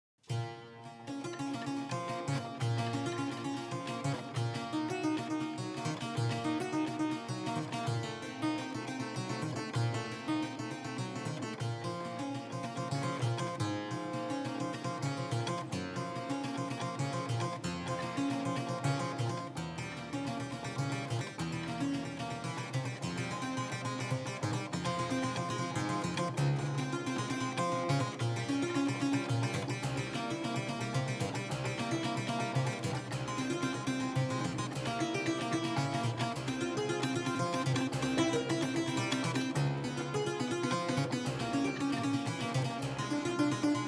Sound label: prelude in c minor